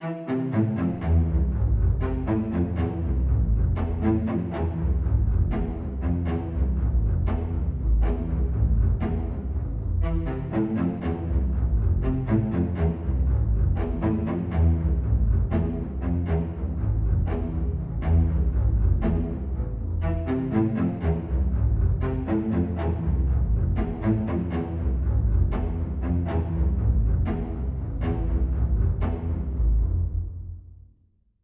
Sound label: Cinematic Cello Arpeggio